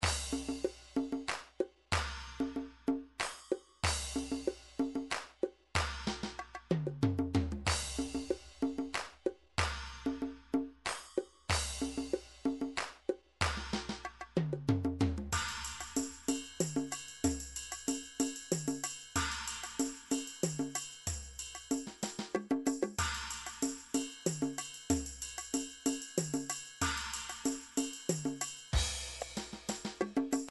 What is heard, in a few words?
drum midi files tsamiko